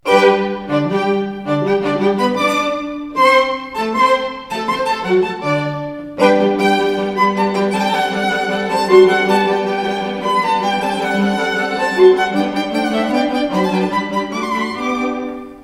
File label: Quartet